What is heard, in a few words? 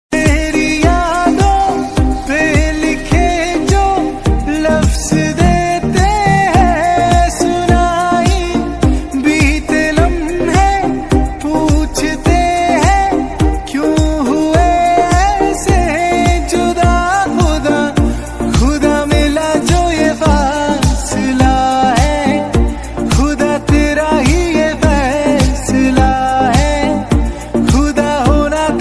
Sad Hindi Ringtone